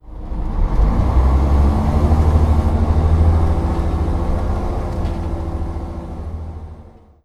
volvo olympian; Simutrans; Pak128.Britain-Ex

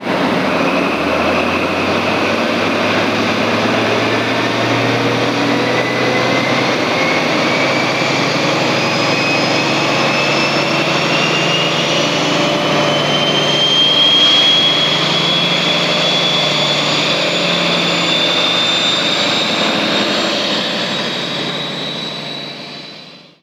hst valenta; mark appleby; simutrans; Pak128.Britain-Ex

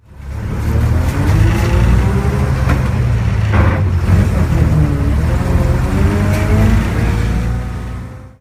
leyland titan td4; Simutrans; Pak128.Britain-Ex

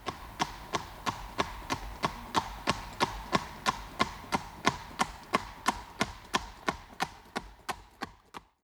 horse; ylearkisto; simutrans; Pak128.Britain-Ex

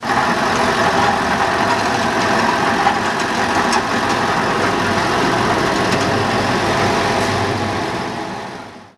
ng diesel; simutrans; Pak128.Britain-Ex

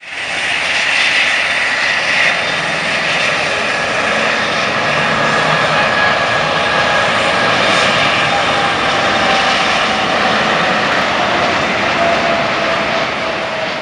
boeing 747-8; laxspotter97; simutrans; Pak128.Britain-Ex

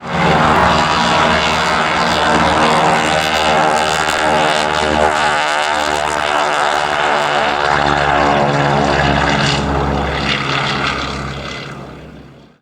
Lockheed Electra 10A; simutrans; Pak128.Britain-Ex

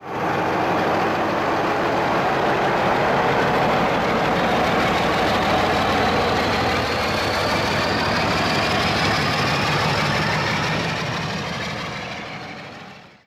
class 46; russell thewils; simutrans; Pak128.Britain-Ex

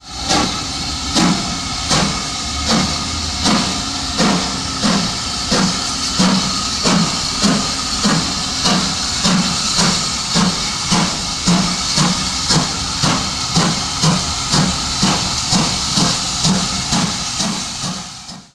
gwr city class; nick parry; simutrans; Pak128.Britain-Ex

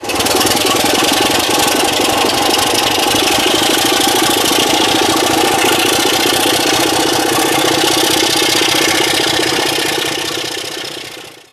class 25; simutrans; Pak128.Britain-Ex

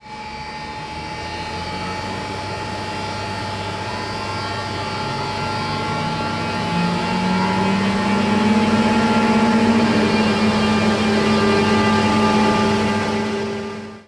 eurostar; panos g; simutrans; Pak128.Britain-Ex

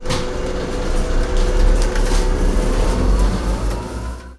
BYD electric bus; simutrans; Pak128.Britain-Ex

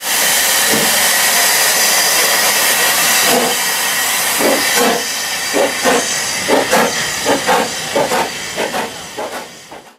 double fairlie; simutrans; Pak128.Britain-Ex

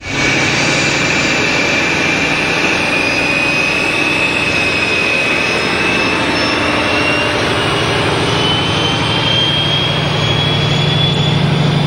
HST Valenta; x84asrd84boxy; simutrans; Pak128.Britain-Ex

